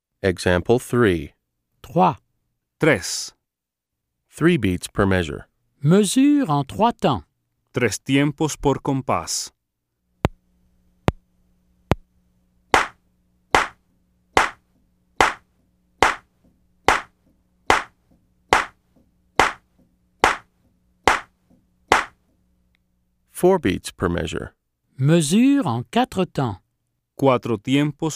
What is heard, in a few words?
Keyboard